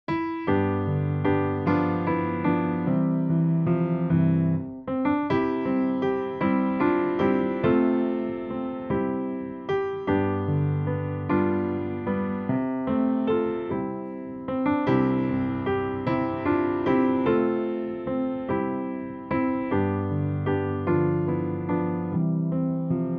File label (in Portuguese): (Backing), playbacks musicas criancas; primeiros passos